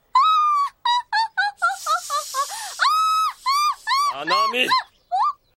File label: Laugh; Nanamis